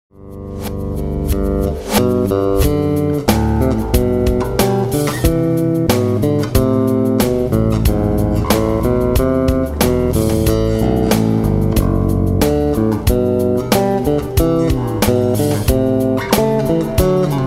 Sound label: Bouree in E Minor